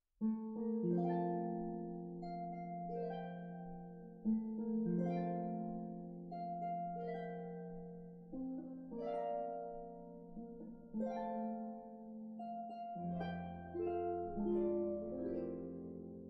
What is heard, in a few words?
hush